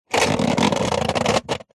impacts metal small tin like hollow bend pressure creak